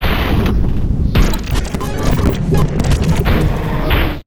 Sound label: Glitch; Tutorial Smith